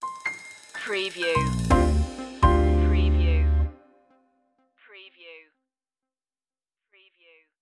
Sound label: carefree day out stinger